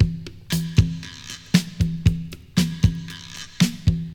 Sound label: 117 bpm drum loop sample e key